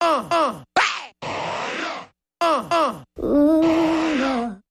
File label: R & B Vocal Variation